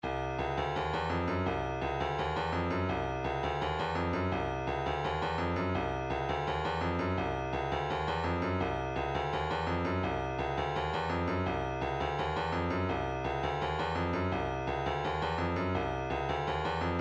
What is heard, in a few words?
deep latin, midi